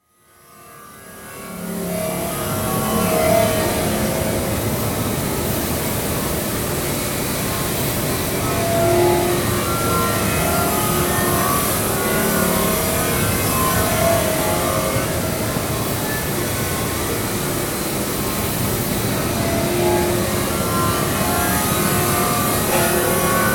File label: Stainless Steel Making, slab grinding machine; Hand Tool Sound Effects; BBC